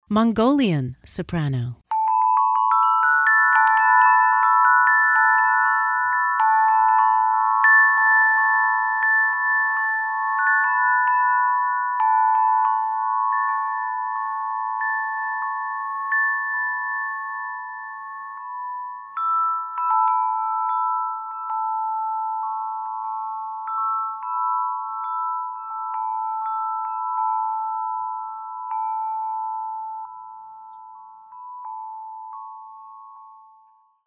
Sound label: Mongolian Soprano